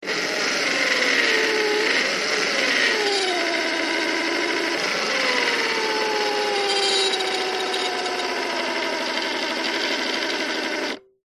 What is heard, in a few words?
science fiction robot tracks wheels whirr